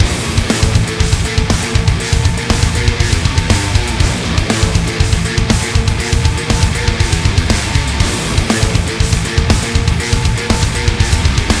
Rock; Barotrauma; DynamicEuropa

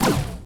Laser shot; Alien; Barotrauma; Barotraumatic

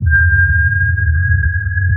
sonar Ping Suit Very Close; Barotrauma; Real Sonar

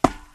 metal, Footsteps; Barotrauma

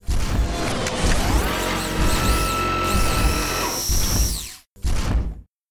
deconstructor, Fabricators; Barotrauma; Subsurface

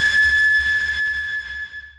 sonar Tail Water Medium Shuttle Directional; Barotrauma; Real Sonar

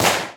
tazer; Weapons; EK modern; Barotrauma

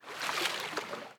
Splash, Water; Barotrauma; Subsurface

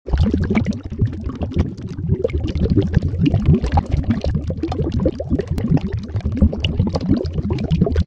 Bubbles Loop Mono; Soundproof Walls 2.0

